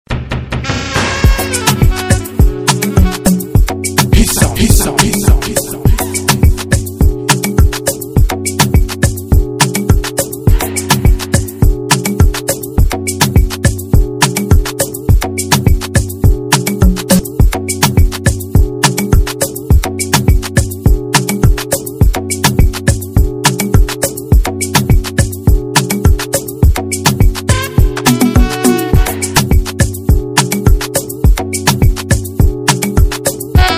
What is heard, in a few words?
INSTRUMENTAL